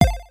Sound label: Jump; chicken gun